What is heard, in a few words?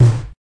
ANALOGUE SNARE; drum machines